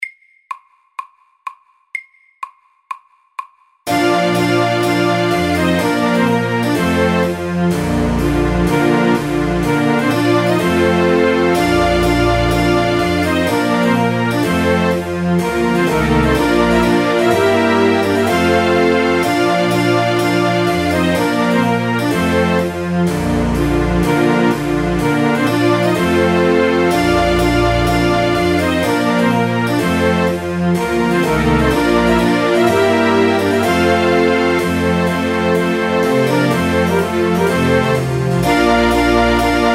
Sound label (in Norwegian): Trumpet tune FORT